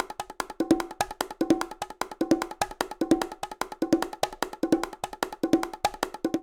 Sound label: Latin Jazz Bongo 149bpm; Latin Percussion; Ableton; Factory Packs